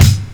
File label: Kick Kat Break; Drum Hits; Kicks; Loopmasters; Jon Carter & Alex Blanco; Block Party Essentials